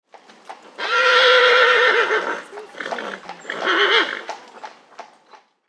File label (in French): Cheval